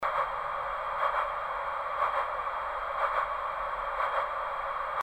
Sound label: heart; Herzschlag; myHummy